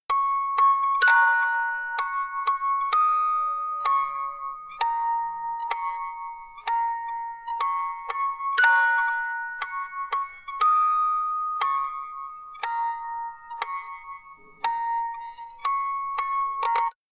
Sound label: music box phrase